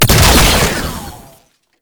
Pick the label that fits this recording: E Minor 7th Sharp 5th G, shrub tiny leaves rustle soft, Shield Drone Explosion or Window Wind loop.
Shield Drone Explosion